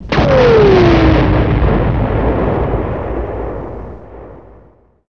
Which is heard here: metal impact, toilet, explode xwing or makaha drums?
explode xwing